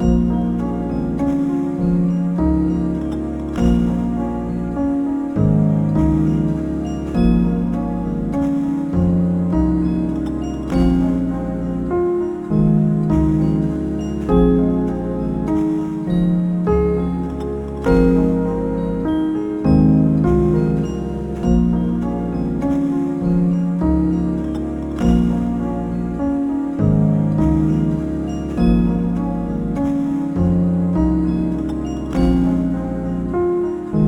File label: melodic atmospheric